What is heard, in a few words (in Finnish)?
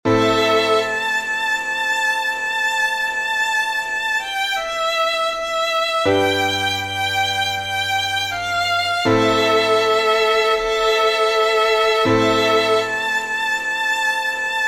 koputuspolkka